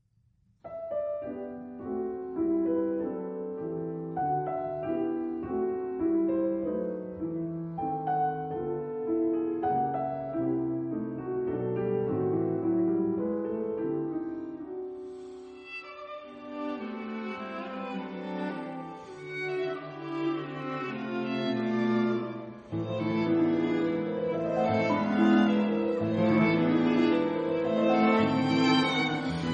Quatuor piano

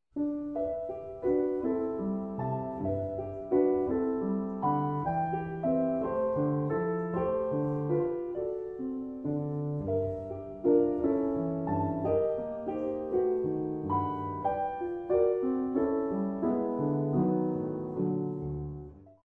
piano

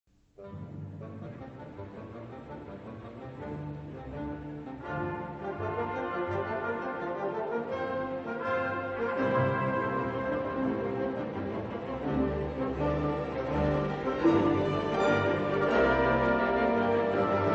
Menuet pompeux; ActeII

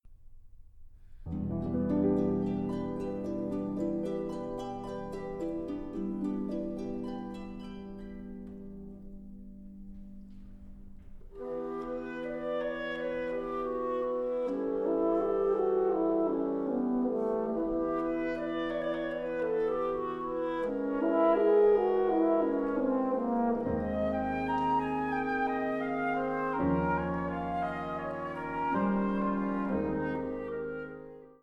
TIMPANI

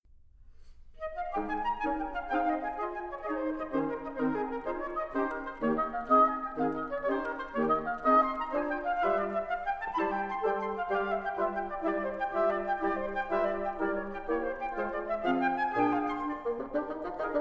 TIMPANI

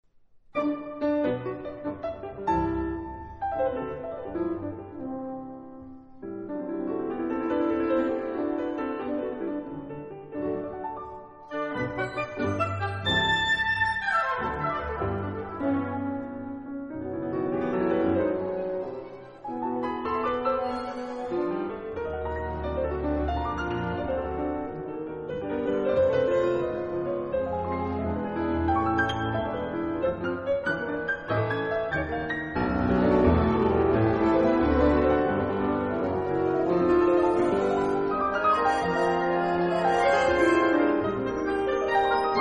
piano Danse; Concertante

